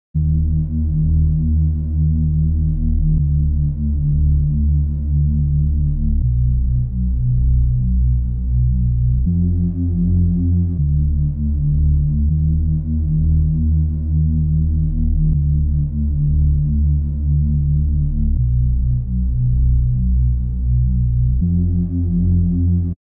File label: 158 BPM D# Min Bass, Hip Hop; Melody Loops; Cymatics; Barrel